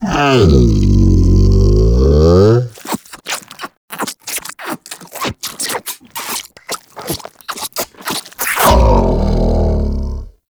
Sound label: Helminth Kiss Pet; Liset Alchemy Lab